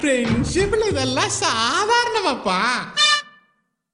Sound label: whistle tone; nanban